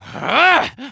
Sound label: Medic taunt exert